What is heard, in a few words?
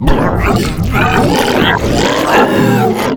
mega puke